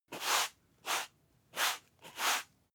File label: broom sweep light